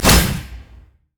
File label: deadly bloom; LoL; zyra